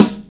phat acoustic snare g sharp key, Royality free acoustic snare sound tuned to the G# note; snare drum samples